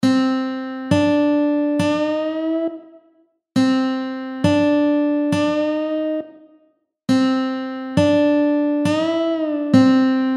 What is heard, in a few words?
bending